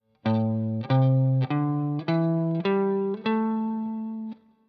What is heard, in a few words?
A Minor Pentatonic Example, guitar